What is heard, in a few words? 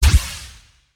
laser, ships; CosmicRage; CosmicRageSounds